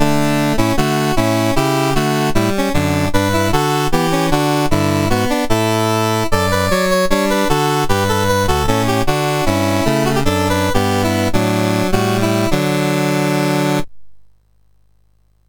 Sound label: sid MOS6581R3; Games Summer Edition menus